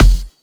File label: Kicks; Vengeance Samples